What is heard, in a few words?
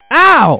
owwwh